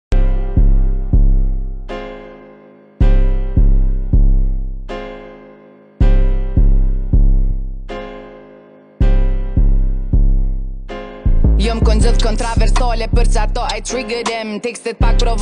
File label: Beatbox Freestyle